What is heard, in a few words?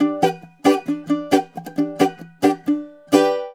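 bouzouki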